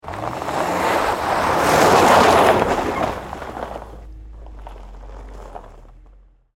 Tires Spin Snow, Transportation, Automobile, Tires, Spin, Donut, In, Snow, Ice, Crack; Saturn, Vue; BlastWave